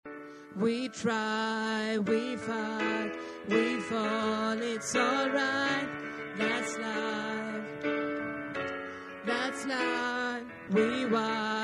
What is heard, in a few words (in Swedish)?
bas